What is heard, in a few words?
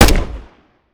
fire; gmod; viper; weapons